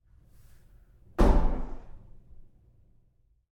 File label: Door Bathroom Stall Open Roomy Lite w Bounce